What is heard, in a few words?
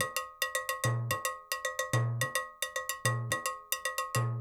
NYC Percussionworks loops 108; Sampleheads